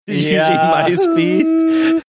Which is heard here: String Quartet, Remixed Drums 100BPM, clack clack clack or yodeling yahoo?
yodeling yahoo